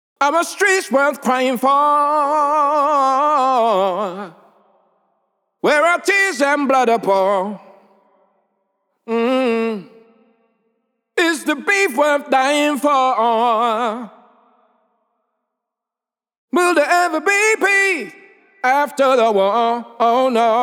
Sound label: vocal hook flow male wet Gm